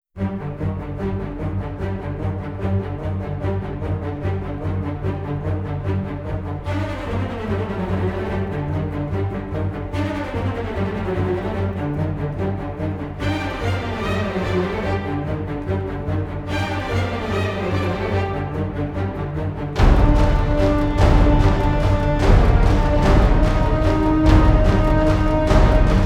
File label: (No Choir), Orchestra